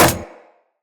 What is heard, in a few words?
Rolling Drone Landing; Characters; Grineer